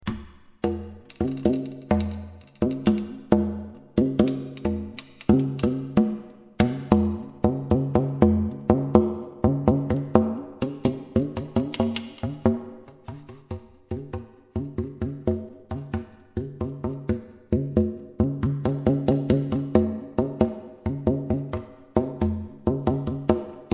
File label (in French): percussion fa, alphorn